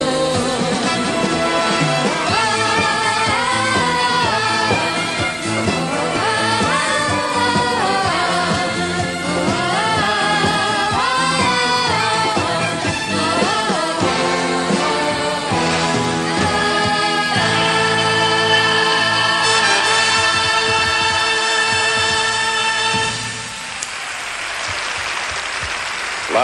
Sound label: morocco ringtone